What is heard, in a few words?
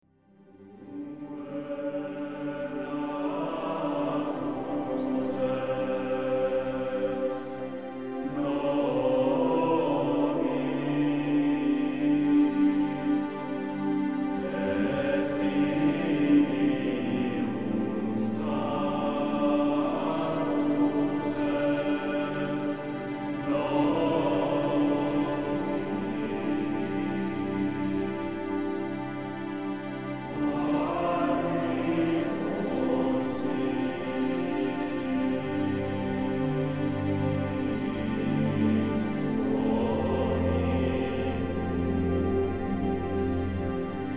Gregorian